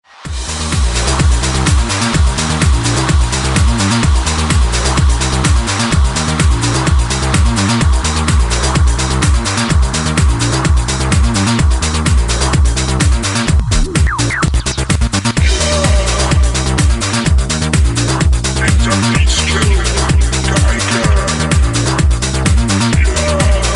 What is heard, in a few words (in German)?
Disco Geiger